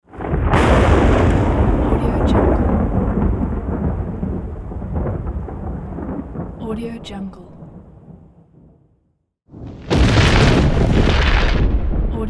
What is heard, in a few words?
the thunder